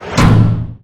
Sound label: hammer; SuperTuxKart